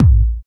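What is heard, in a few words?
BD Sub, bass drum; Takebeat; Club; VEC1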